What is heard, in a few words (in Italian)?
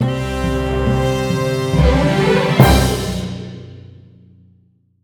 fanfara; Loot Hunter